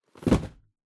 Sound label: Cardboard Box Put Down Stack; ダンボール; 置く 積む; 梱包材